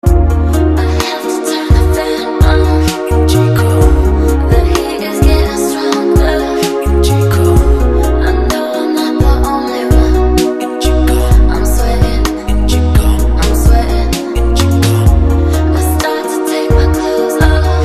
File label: chill mix